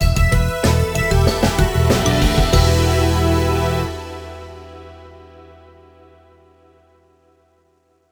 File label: Stinger Game Over Screen; Bubsy The Woolies Strike Back